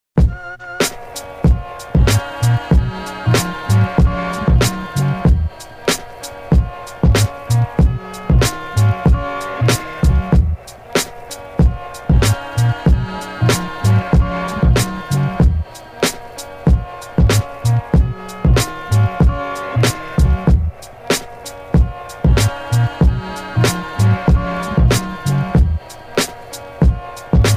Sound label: instrumental